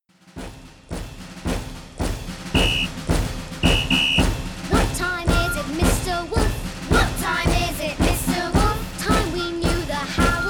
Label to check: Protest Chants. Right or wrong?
Right